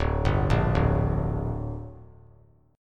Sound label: D Suspended 4th Sharp 5th D down, chord; arpeggiated